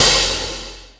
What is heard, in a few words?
Open Ball Hi-Hat; KJ Sawka; Drum n Bass & Beyond; Drum Tool Box